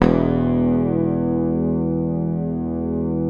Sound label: PICKED, Bass, E1; Sampleheads; Will Lee Bass Library